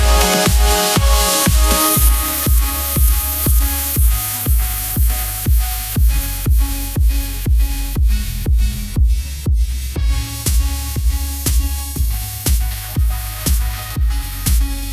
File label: festival lights pop anthem, ringtones